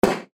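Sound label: balloon popped in a wide shot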